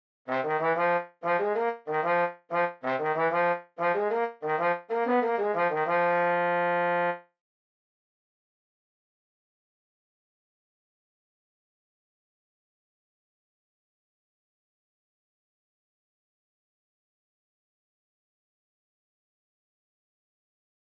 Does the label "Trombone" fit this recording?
Yes